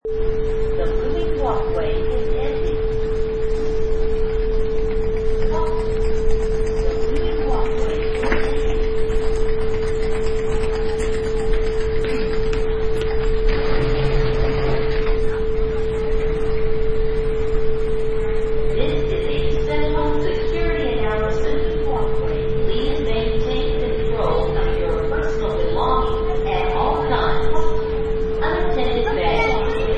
Airport Ambience

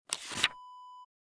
page flip

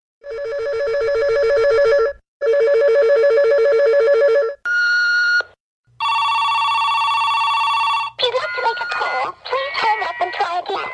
ring phone; Ringtone Records

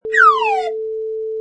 Slide Whistle Rise

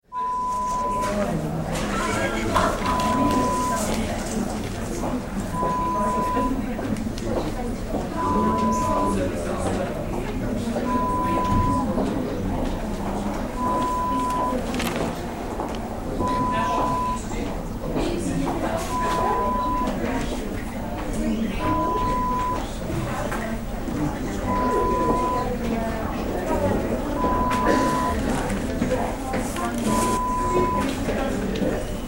Hospital ambience sounds

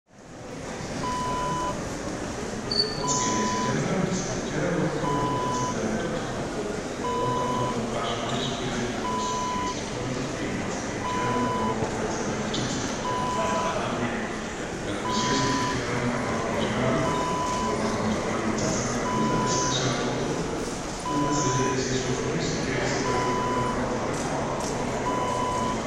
airport terminal

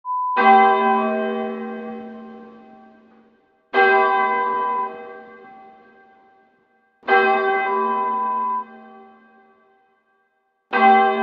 Church Bell sound

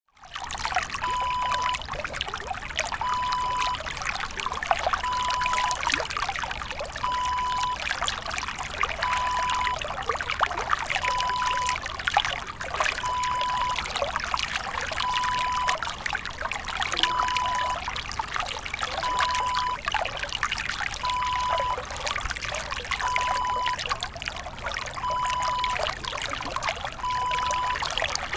water stream small

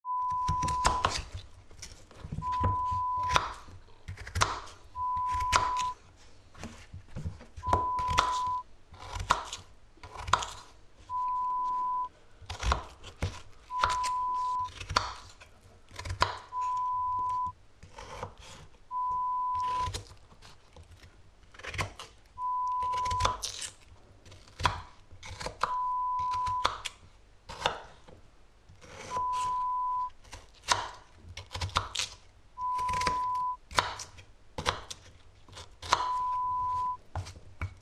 Chopping veg sounds

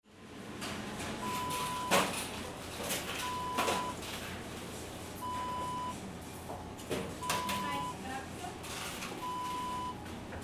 fast food restaurant

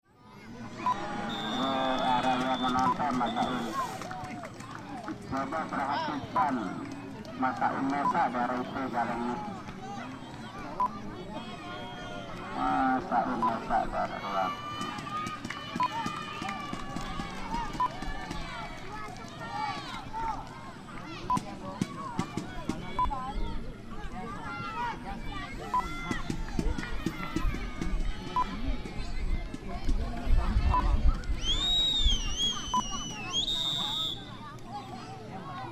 Indonesian Football Match

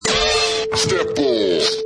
Vinyl Scratch

